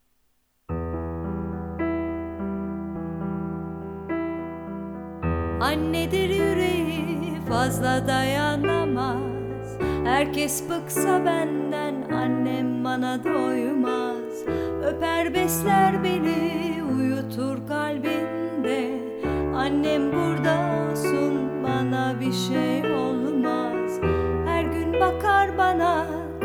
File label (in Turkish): vokal